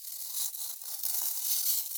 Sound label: source signal, recordings under ice